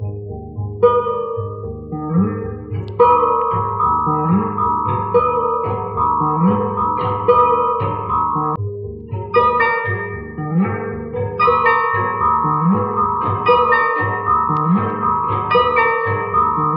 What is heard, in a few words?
guitar and keys woke enough for you, Loops, Jazzmatazz